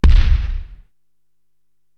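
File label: bass drum Cannon, Korg TR-Rack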